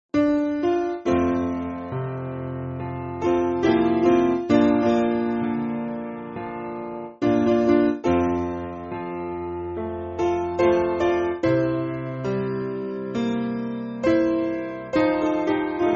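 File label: Piano, Free Music Backing Files for Churches; Spiritual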